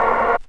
Polaris Rave Stab Vinyl Synths A#2; vinyl chords

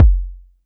BD

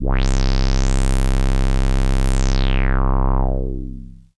BASS

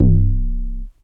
BassStation Square Round Vinyl Synths C0, bass

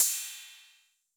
Cym A 808 Sat Decay A, Cymbal, Multi Saturated

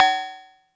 Cowbell FX

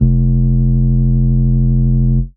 F Bass

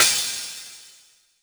Hihat

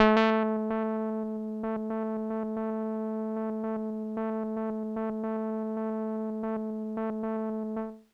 Kawaii A2; Randroid

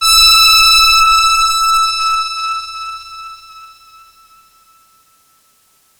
big brass E5

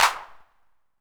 Clap 808 Color, Hand Clap

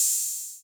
OH 808 Decay, Open HH; Clean; Digital

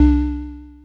SYNTH Little blip Low

